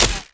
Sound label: Menu Choose; Playable Tutorial Level